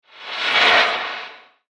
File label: Steampunk Swoosh; Whoosh Sound Effects